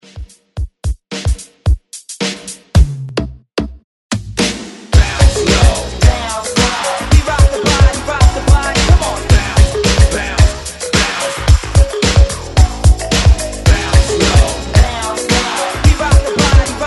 80s reworx

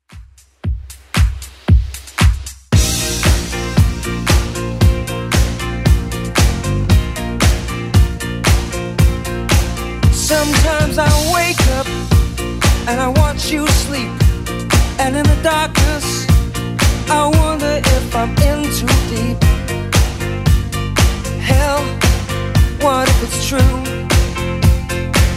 80s Club Edit 115bpm

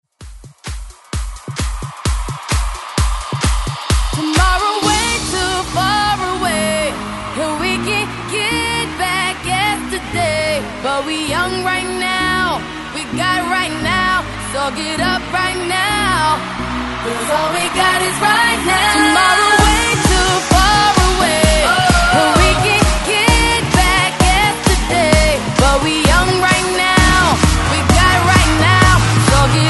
ReDrum 130 Bpm; DJDOLLS

